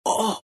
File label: pain; dota; phantom assassin